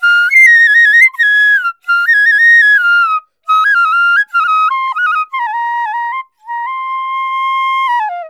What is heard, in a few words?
INDIA PICC